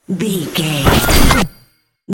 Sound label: magic conjure charge